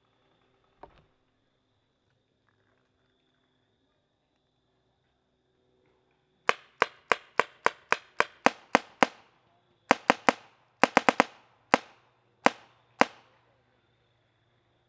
spyder barely clip, guns; spyder se

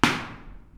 splat metal thin; splat metal thin clip; splats

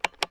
trracer cock, guns

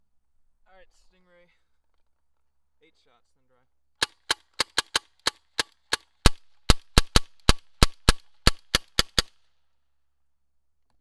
stingray raw slight clip, guns

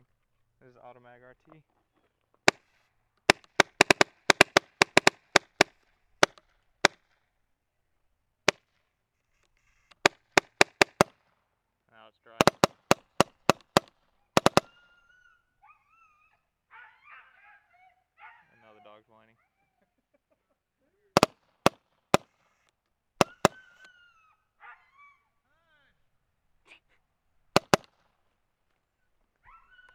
automag shotgun, guns; automag rt

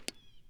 splat block; splats

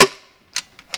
pgp, guns

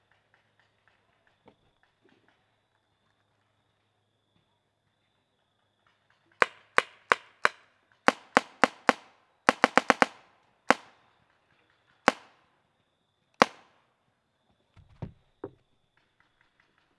spyder, guns; spyder se

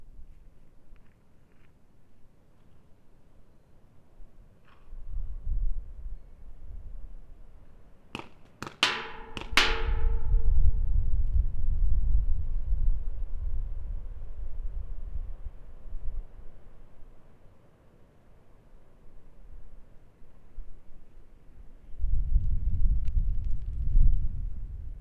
splats barrel long barrel

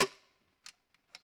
pgp step, guns

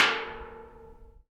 ball hit barrel, splats

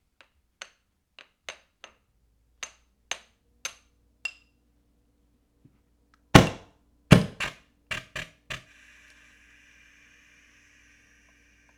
automag gas up fart, guns

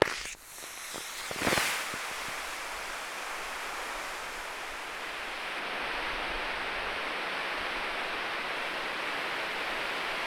smokegren, guns

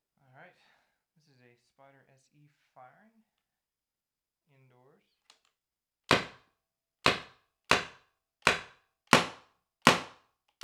spyder indoors raw, guns; spyder se

